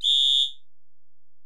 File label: Ac Perc, Drum Machines Alesis DM-PRO